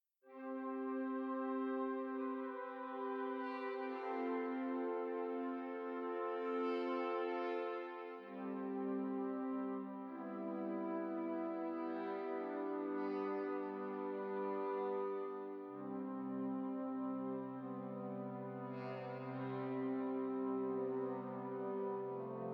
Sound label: woodwinds; Spitfire